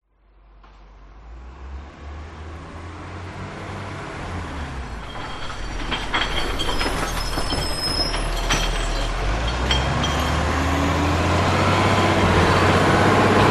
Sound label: truck garbage empty recycle bin away slight distance residential street